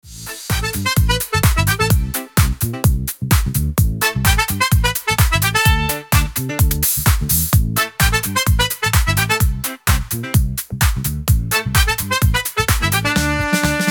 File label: background music